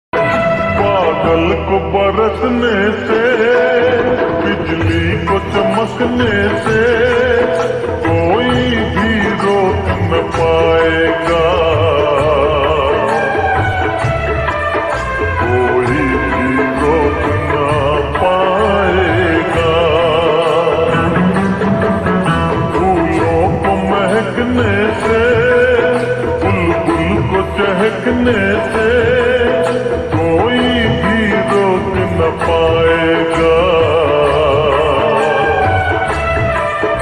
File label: Ringtone slowed and reverb